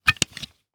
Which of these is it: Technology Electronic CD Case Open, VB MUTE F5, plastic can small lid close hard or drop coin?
Technology Electronic CD Case Open